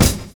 OPEN FIRE; Univers Sons - Kitch 70s

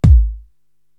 BD Pop; Korg TR-Rack

